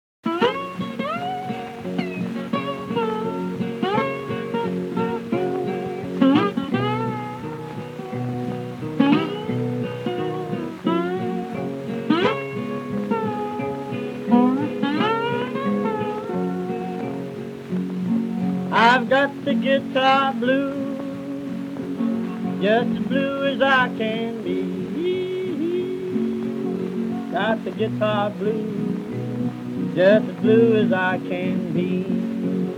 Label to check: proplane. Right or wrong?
Wrong